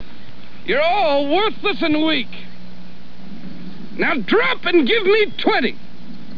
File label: drop